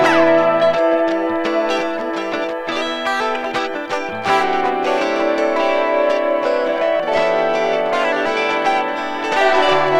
ride

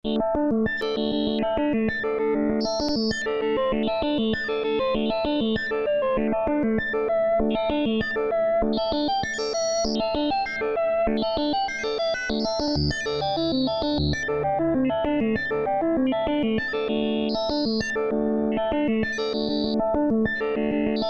ring chop

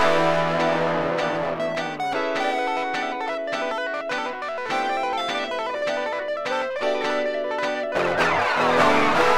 boom

